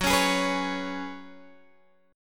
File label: Gb Suspended 2nd Suspended 4th, Guitar, Open G; down; chord shape